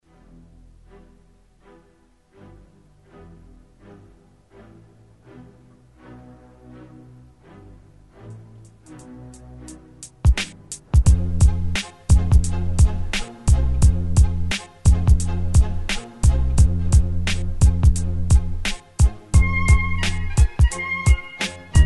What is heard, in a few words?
Beats